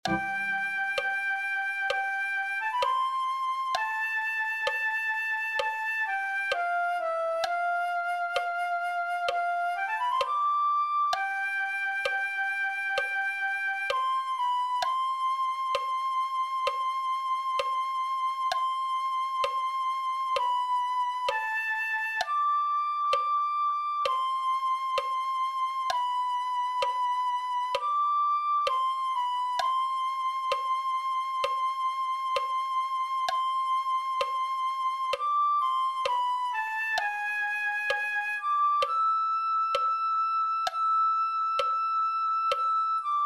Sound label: flute